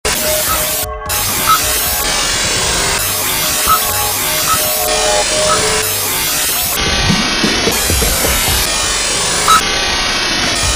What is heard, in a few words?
Birds And Bells